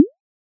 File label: Bubble Tiny